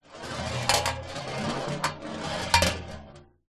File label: industrial metal ball bearing roll on metal plate surface